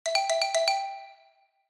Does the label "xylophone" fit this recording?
Yes